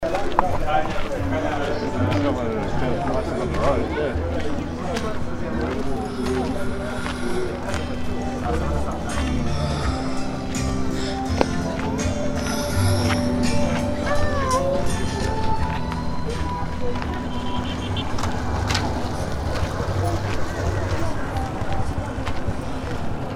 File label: Rwanda soundwalk